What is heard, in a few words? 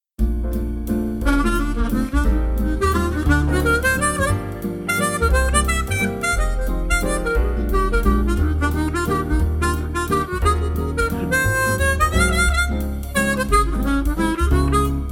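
Chords Impro, harp; Seydel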